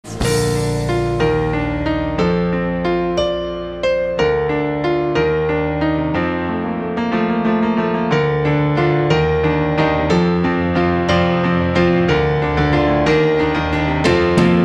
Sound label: piano